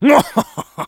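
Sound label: laugh short, French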